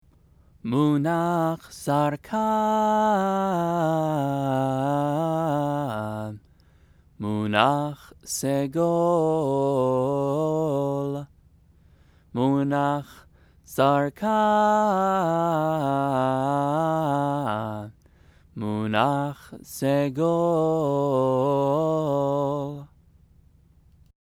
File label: megillah; trope